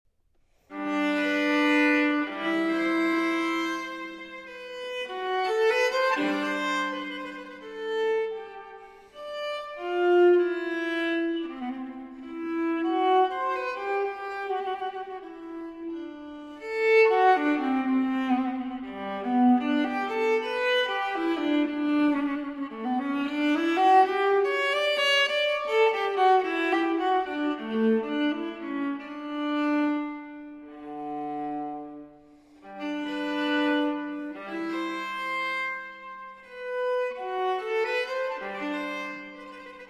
Cello Suite No. 1 in G Major, for Viola, Sarabande; J.S. Bach Cello Suite No. 1 in G Major, BWV 1007 (Transcr. for Viola) IV. Sarabande